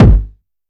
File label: TR909 kick, Roland SH-32; Drumset 02